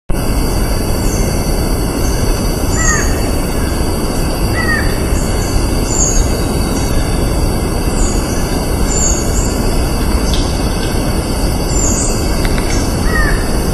Thamnophilus caerulescens; PP Mocona

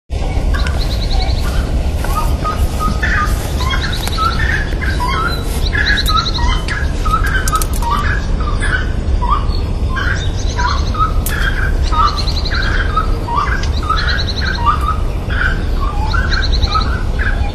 Aramides cajaneus; Jorge La Grotteria

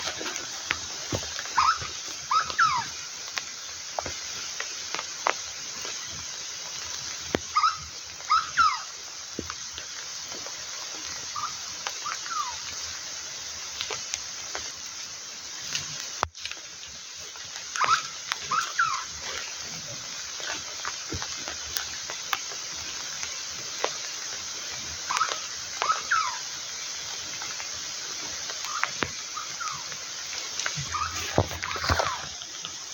Carpornis cucullata

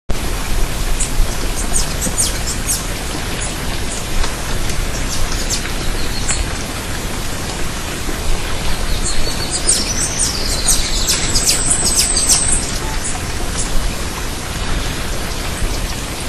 Thlypopsis ruficeps; Portal de Piedra; Jorge La Grotteria

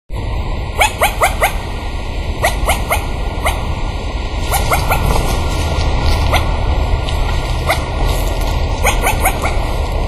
Pteroptochos tarnii; Parque Nacional Los Alerces

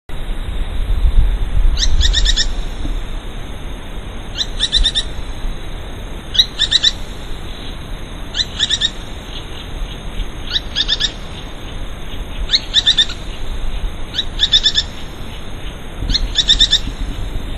Synallaxis spixi; Reserva Natural Otamendi

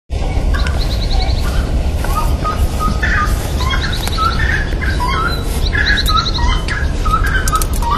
Synallaxis spixi; Jorge La Grotteria

